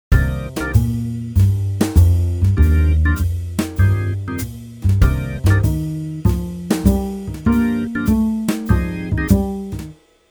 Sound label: jazz